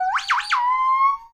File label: Gibbon Call, Reality Bytes, notifications